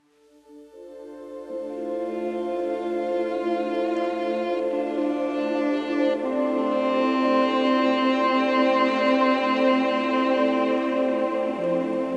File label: Tranquil Vibes